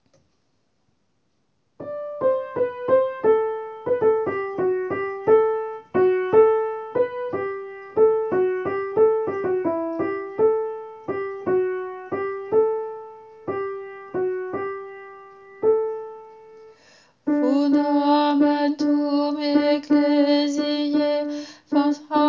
maria virgo alto; maria virgo virginum

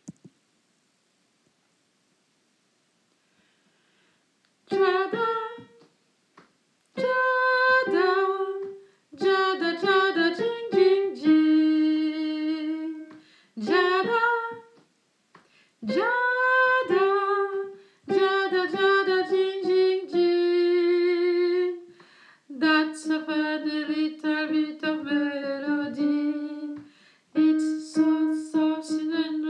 ja da alto

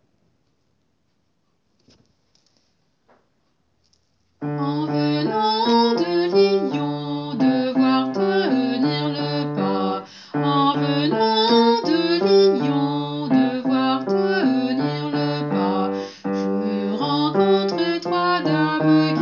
lyon basse; En venant de Lyon

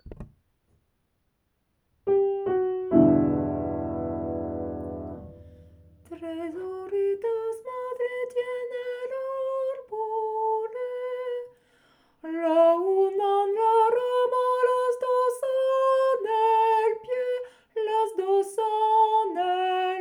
soprano; tres hojitas

